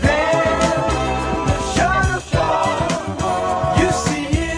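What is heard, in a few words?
chorus, 105 bpm; MELODIC LOOPS; SAMPLED MUSIC LOOPS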